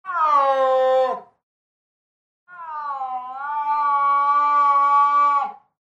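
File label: leopard voice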